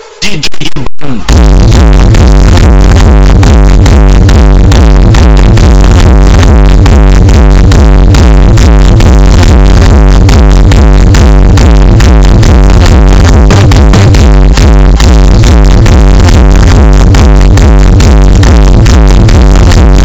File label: bass boost